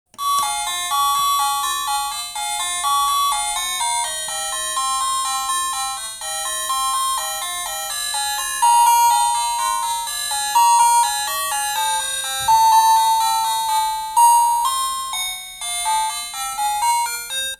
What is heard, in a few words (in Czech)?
melodie